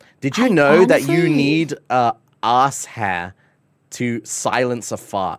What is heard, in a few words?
fart silencer